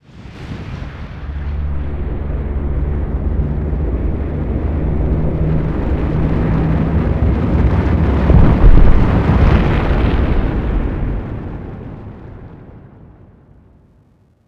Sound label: building collapse